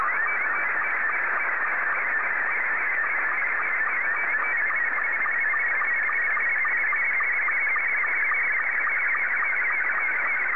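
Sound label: Scottie 2, slow-scan television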